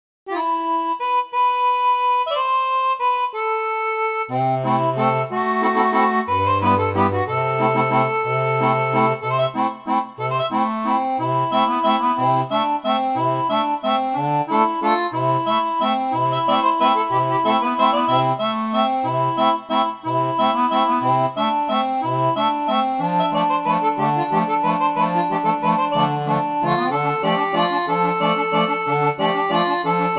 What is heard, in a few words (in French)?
Complainte, seraphone, orgue barbarie